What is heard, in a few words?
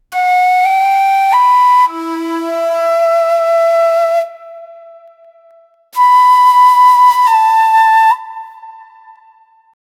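shakuhachi flute; japan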